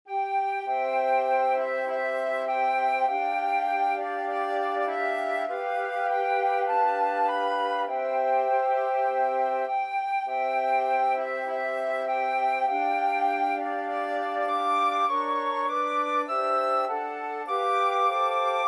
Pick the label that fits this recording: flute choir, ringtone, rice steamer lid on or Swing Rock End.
flute choir